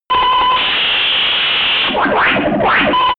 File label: arcade fire